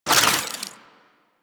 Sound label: Idle Spin, Weapons; Warframe; Parazon